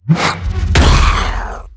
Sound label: berserk boss attack